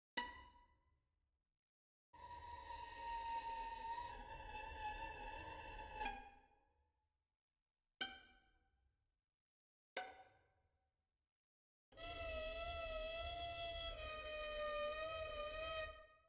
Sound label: repeat vln II; devolution